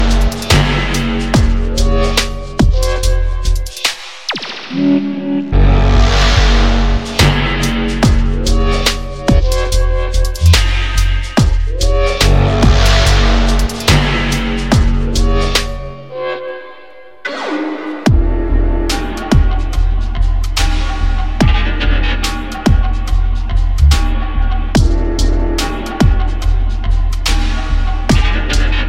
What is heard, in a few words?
drone